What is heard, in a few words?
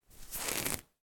horror cobwebs sweep away by hand